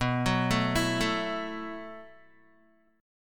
B 7th Suspended 4th B, Guitar; B7sus4; arpeggiated

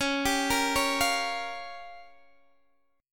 Db 6th Flat 5th Db down Guitar DADGAD

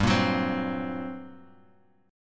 G Minor Major 11th, Bass Standard; GmM11 strummed; 15,13,16,17; JGuitar

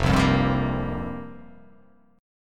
F Suspended 2nd Suspended 4th F down

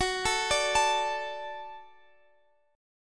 F sharp Minor Sharp 5th, Guitar, Drop D; chordshape; down

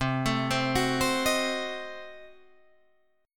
C Diminished 7th down Guitar Drop D

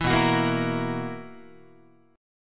B Major 7th Suspended 4th B, Guitar; BM7sus4; strummed

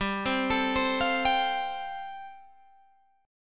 G 9th Suspended 4th G down Guitar Open G

